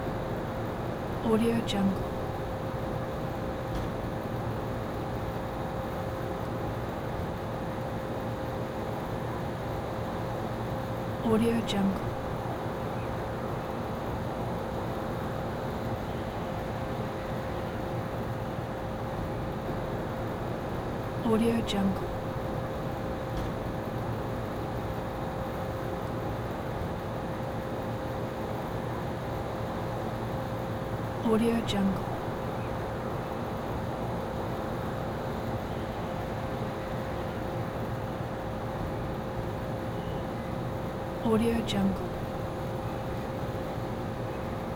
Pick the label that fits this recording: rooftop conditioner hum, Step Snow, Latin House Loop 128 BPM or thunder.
rooftop conditioner hum